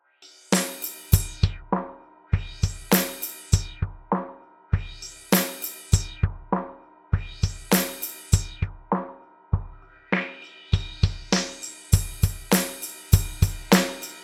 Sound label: Moog Filter